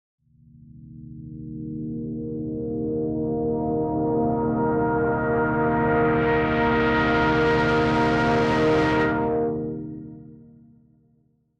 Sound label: Long Riser